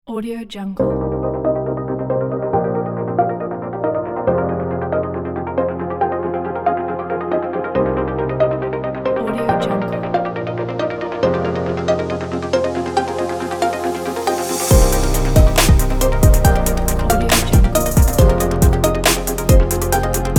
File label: modern intro logo